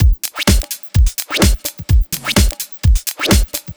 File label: Tribal Dish, Drum Loops, 127 BPM; Sonic Foundry (Sony Creative Software); Underground UK House & Electro